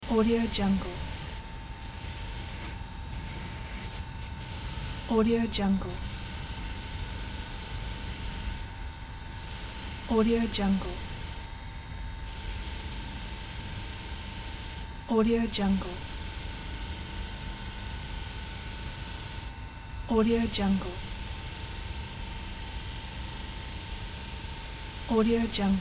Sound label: brushes industrial brushing metal perspective; cinematic sound design